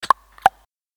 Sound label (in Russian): Уведомление; ВКонтакте